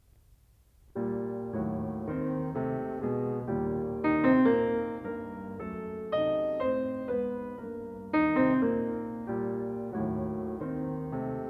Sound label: Carnaval for piano; Schumann; Op9; Rubinstein